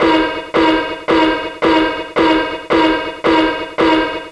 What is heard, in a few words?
victory